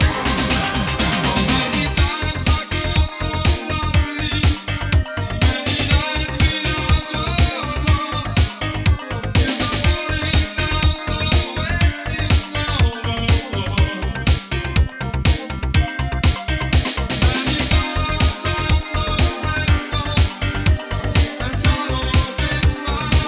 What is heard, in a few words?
Vocal Remix